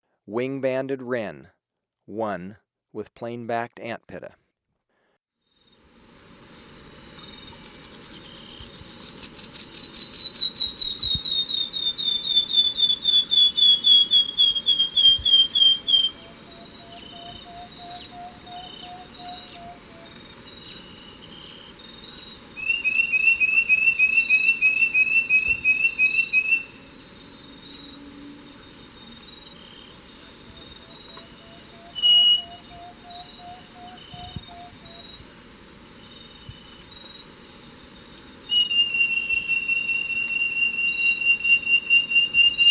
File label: Wing-Banded Wren, Birds of Ecuador; S. America